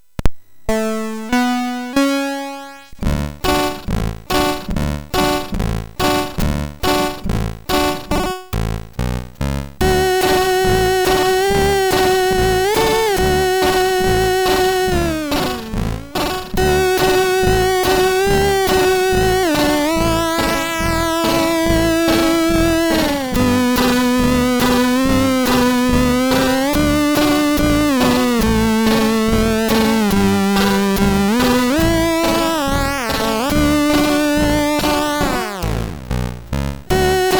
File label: sid MOS 6581R3; Trevor Baileys Music Demo